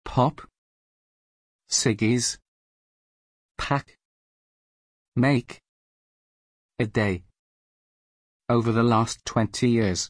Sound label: Porsche